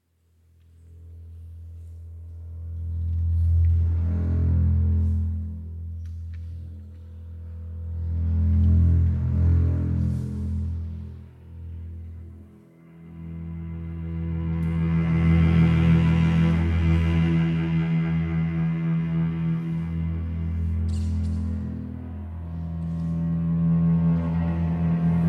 Cello Concerto, Live; I. Icare

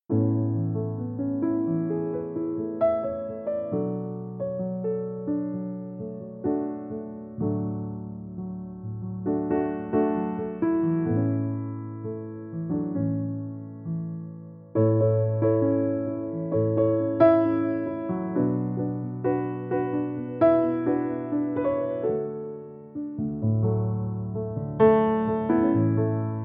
Piano Version

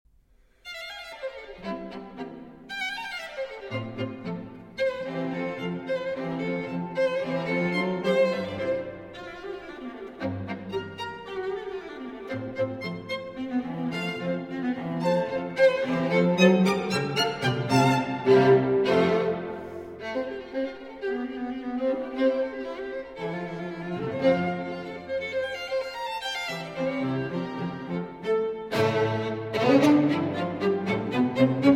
String Quartet; in F Major, Hess 32; IV. Allegretto

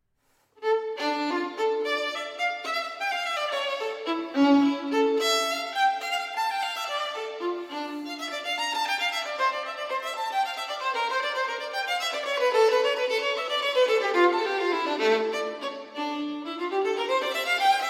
Violin Partita in D Minor Gigue; BWV 1004 IV.

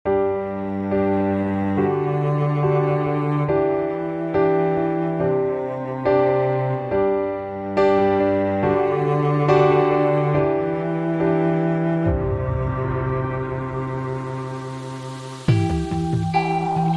Instrumental Karaoke

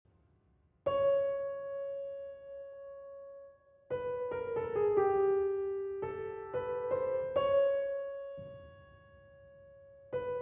Prélude, Solo Piano; Prélude À L'après-Midi D'un Faune; E. Robert Schmitz

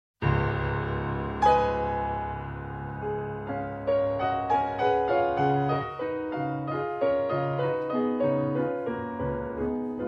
Barcarolle in F-sharp Major

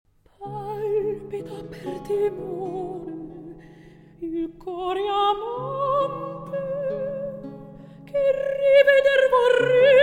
Recitativo; Ippolita

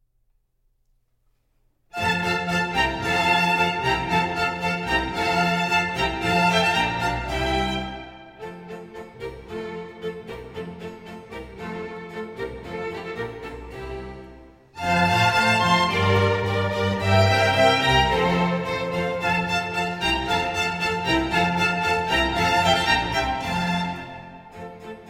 Violin Concerto in F Major, Allegro; The Four Seasons; No. 3; RV 293; Autumn

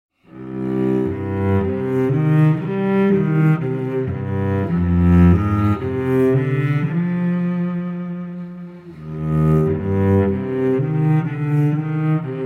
Cello's Lament

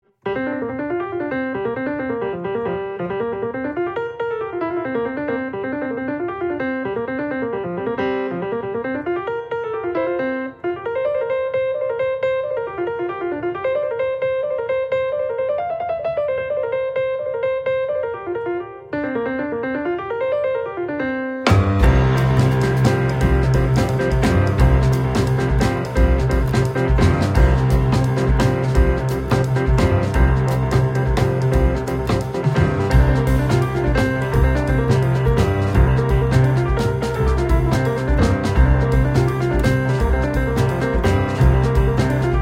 Jig

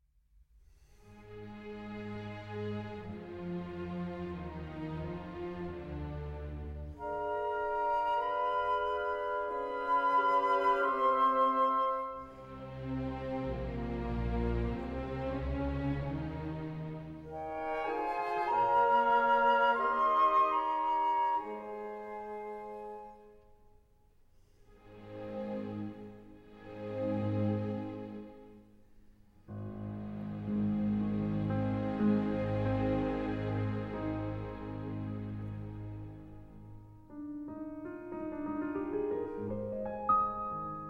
Piano Concerto No. 2 in F Minor II. Larghetto

